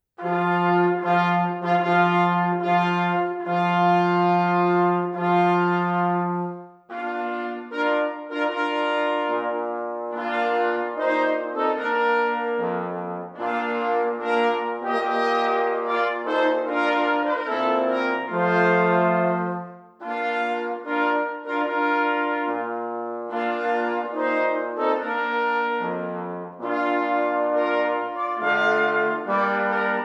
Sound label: Bridal Chorus, brass; Wagner